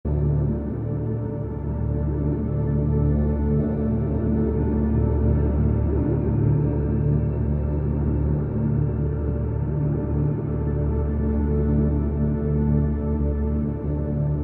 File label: loop